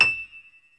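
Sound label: E2 piano; Northstar - New Gold VOL-2; BOS.GRAND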